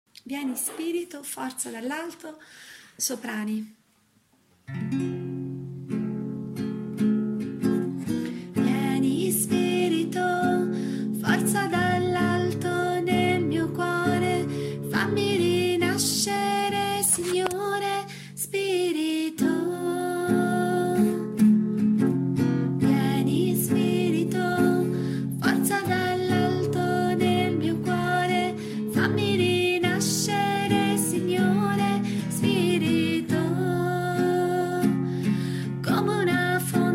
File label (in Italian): Soprani